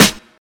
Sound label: big snare sound g key, steel snare drum sample tuned to the G note